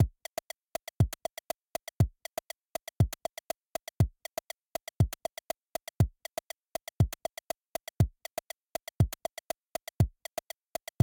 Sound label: Tension Curve